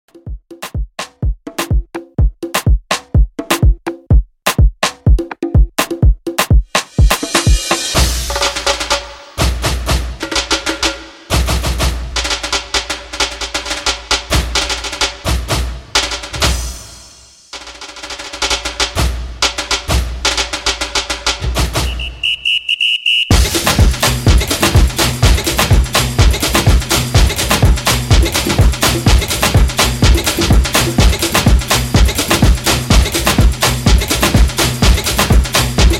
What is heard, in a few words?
Classic Tribal House, 125Bpm